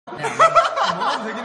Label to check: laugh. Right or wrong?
Right